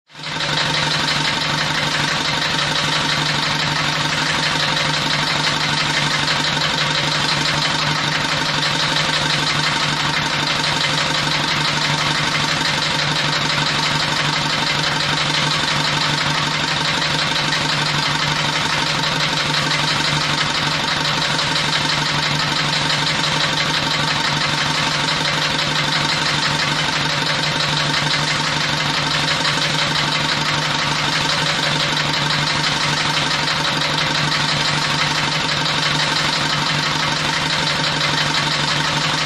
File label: MACHINES - CONSTRUCTION & FACTORY MULE: EXT: Panama canal mule, engine & air compressor running.; EngineAirCompRuns